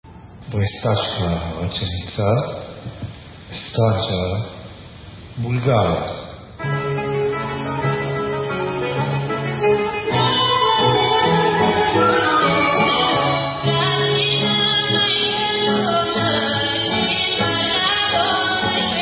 Brestacka Racenica Bulgarian

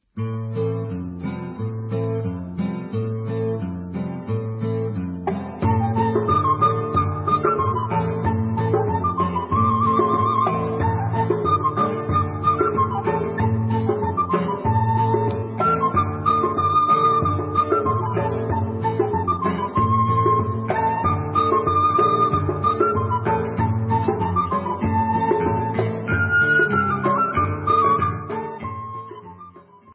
Instrumental

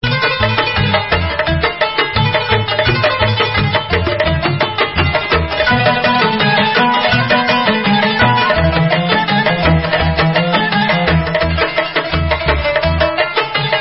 Instrumental

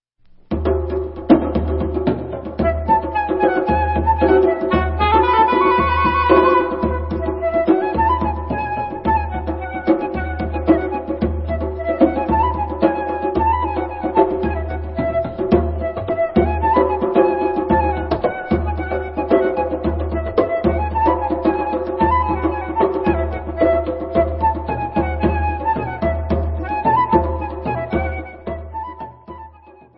Debka, Instrumental

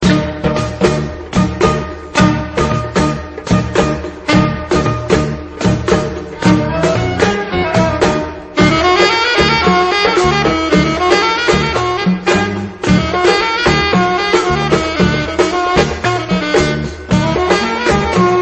Debka, Instrumental

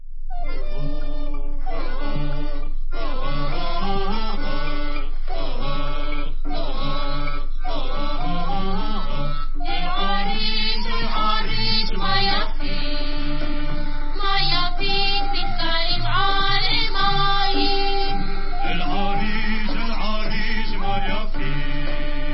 debka, folksong ensamble

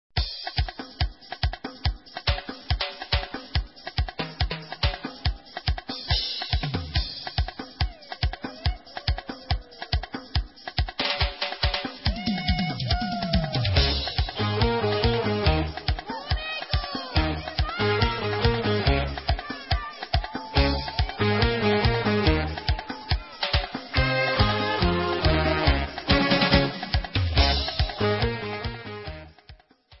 Samba

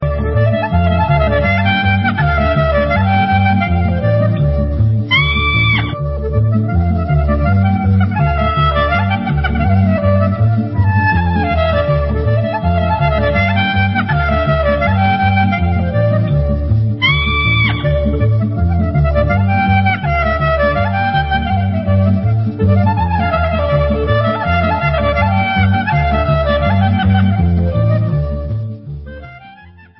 instrumental

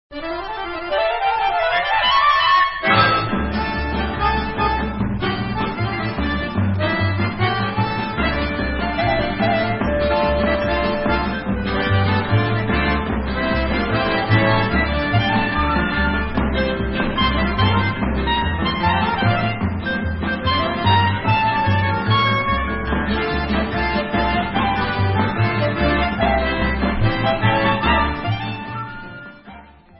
Harmonica C Vocals; Rivka Sturman 1945

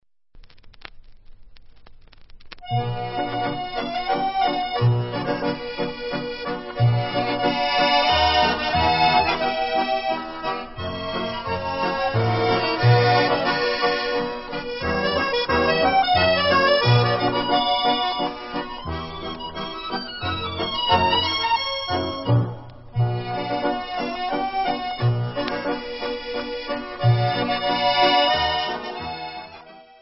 Polonez, Instrumental

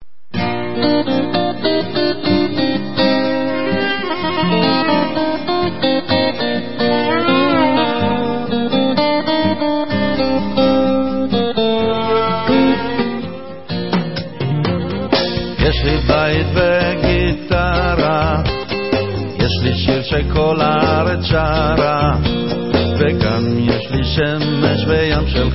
Gitara

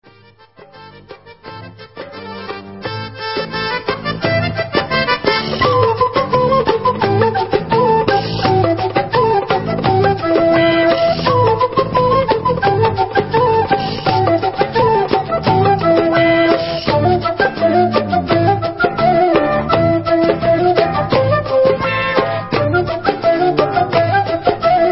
Debka Instrumental

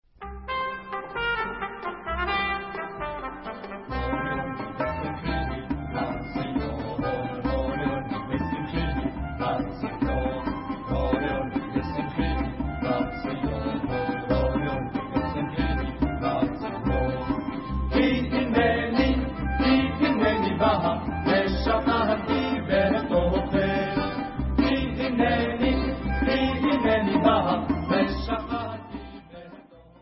Vocal

